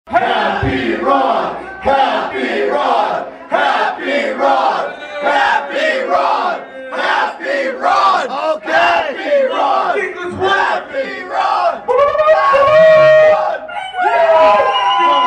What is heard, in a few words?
the happy chant